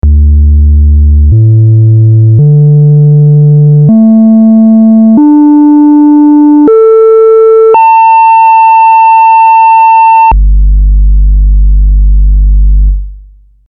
259 Sine, Buchla

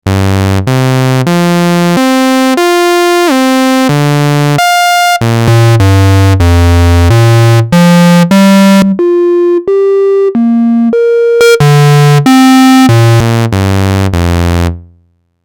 259 Modulation Osc, Buchla

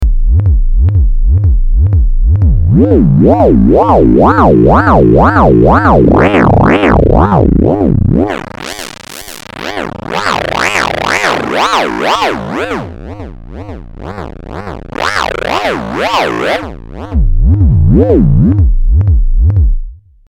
261e Amp n Pitch Mod, Buchla

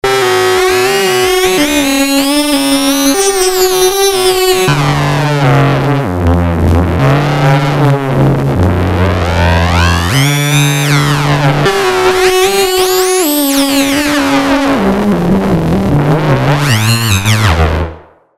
Plan-B Model 25

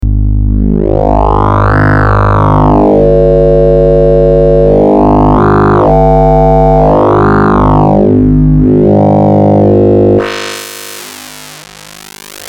261e Tweaking, Buchla

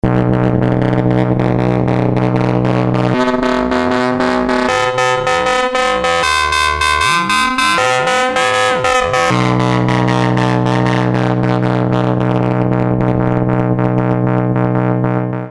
Plan-B Model 25

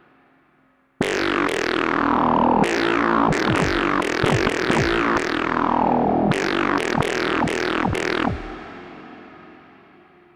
Formant

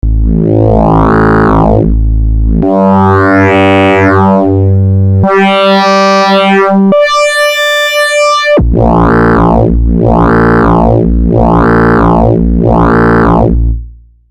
261e Timbre Sweep, Buchla

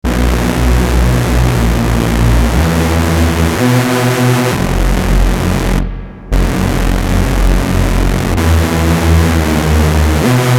8 Modular Oscs

